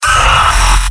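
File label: pain severe, male; tf2; garrysmod; mvm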